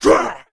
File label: attack, monster; skeleton general